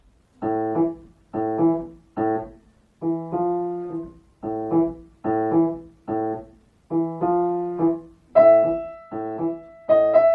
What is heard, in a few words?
Camel Blues